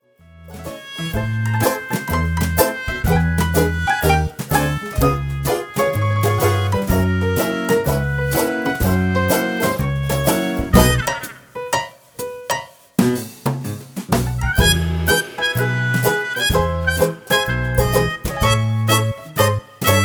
Speakeasy Background Music; bugsy malone

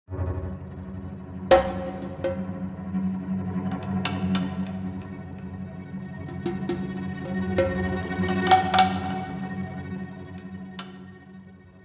Tense Music

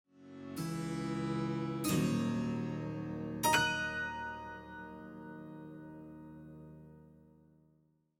Sad Music; bugsy malone backing tracks

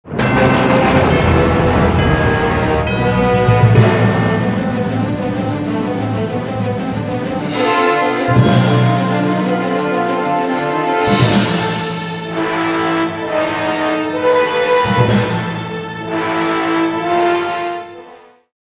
epic dramatic menacing

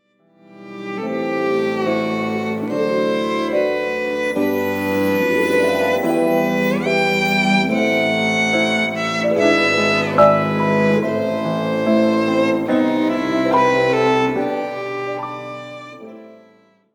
Hearts And Flowers Sentimental Sad Music; pantomime backing tracks

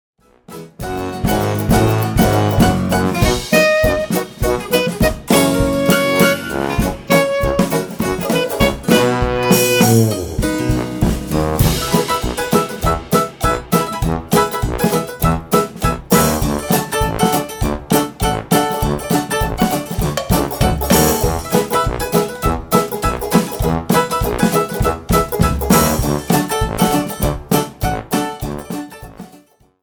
Car Chase

